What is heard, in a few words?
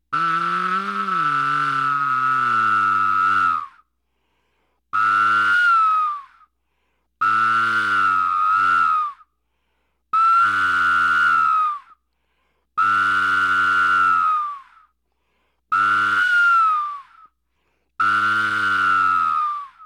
solo